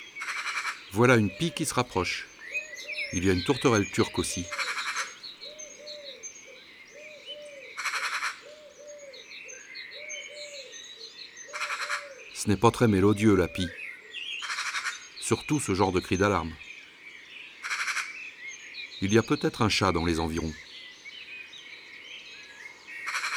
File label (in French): Pie bavarde; ABC des chants d oiseaux